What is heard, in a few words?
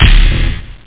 jungle bassdrum flanged; jungle ii